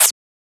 Closed Hat

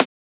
Transient; FLPRO

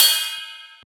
Ride; FLPRO

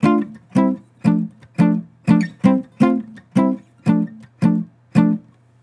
Acoustic Chord Loop 170 BPM D Min; Cymatics

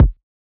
Kick

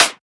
Percussion; FLPRO

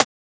Transient; FLPRO

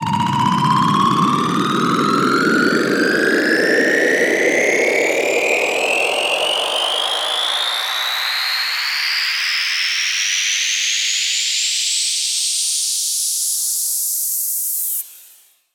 Loop And Noise

